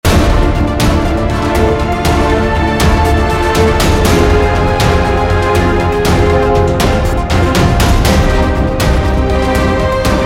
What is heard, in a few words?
Loop Action